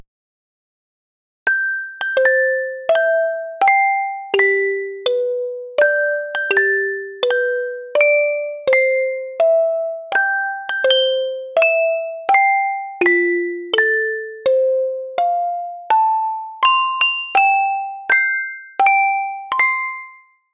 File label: Cursed Birthday Happy Ver, HORROR MUSIC BOX Loop; HauntedBloodlines